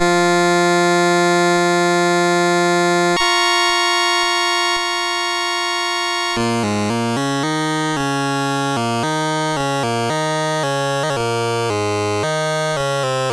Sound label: In Russia, CSG8580R5